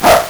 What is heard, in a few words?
LSDj Animals, Nintendo Gameboy; 300 Drum Machines